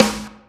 snare, drums; virtual drum sounds; custom drums

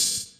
pad, drum sounds; hip hop; virtual drum sounds

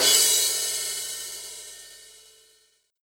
crash, virtual drum sounds

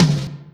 tom, drums; virtual drum sounds; custom drums

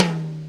tom 4; virtual drum sounds

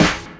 pad, drum sounds; hip hop; virtual drum sounds

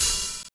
open, virtual drum sounds

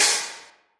stax, virtual drum sounds

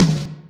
tom, drums; virtual drum sounds; custom drums

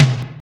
tom 4; virtual drum sounds

